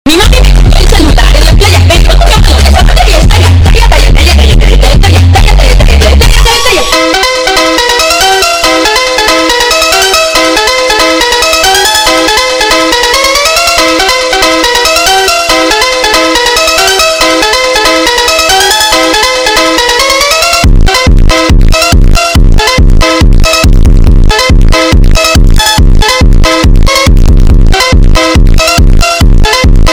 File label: FUNK bassboost